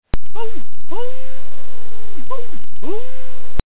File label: dingo; animaux